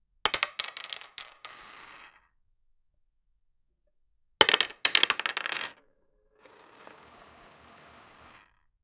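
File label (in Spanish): granos de café cayendo len, grains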